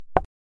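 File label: Supernova Simulation Sound signal; Virgo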